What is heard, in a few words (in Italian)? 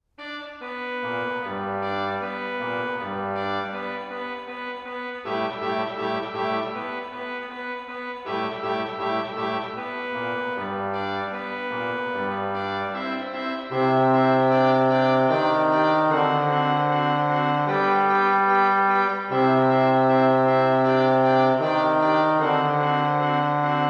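fanfare, organist; 4) Fanfara del cucù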